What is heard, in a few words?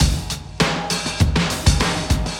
Drum loops Boombap